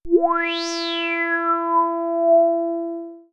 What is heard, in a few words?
Resonance